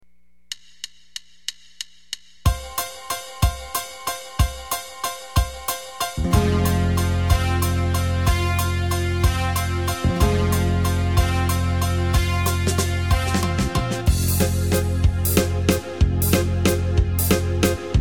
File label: valse; yamaha styles